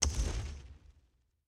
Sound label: click top menu